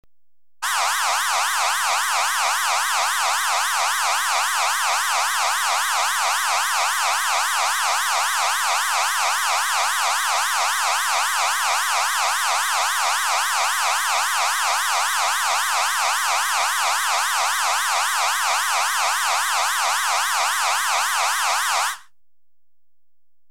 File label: Rapid Cyclical Alarm, Electronically Generated Sounds; BBC Sound Effects Library